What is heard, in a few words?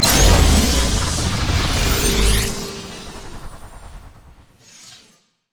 upgrade; Cyber Ezreal